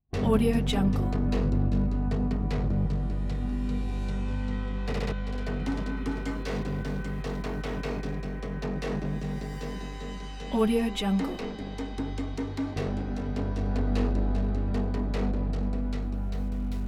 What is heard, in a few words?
mysterious crime underscore